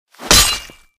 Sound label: sword flesh